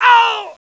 pl pain, player; bts rc

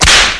snap, tether

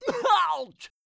ouch; crash bandicoot; fakecrash

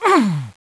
pain; bts rc; construction

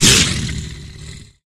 pdog hurt, pseudodog; russian; sven; russian sounds

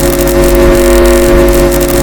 tesla charge hold; hunger

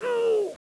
pain, player helmet; bts rc

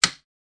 flashlight; sven; bts rc; items

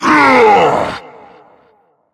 burer telekinetic; russian; sven; russian sounds

